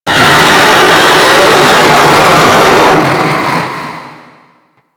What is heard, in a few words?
scream long; Warframe; Stalker